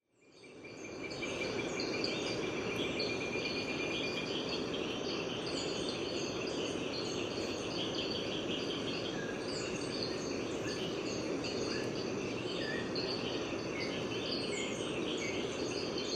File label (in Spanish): paisaje mañana gandoca; Gandoca-Manzanillo; historia natural